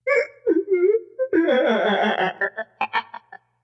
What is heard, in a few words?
Laugh